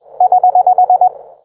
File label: error signal